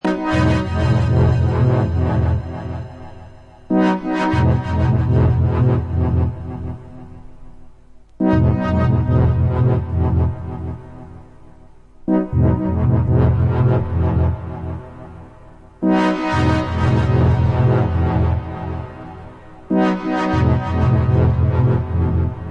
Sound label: ambient